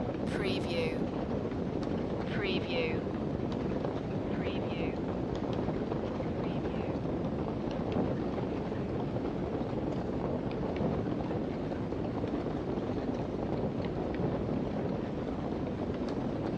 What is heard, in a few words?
Torch Flame Burn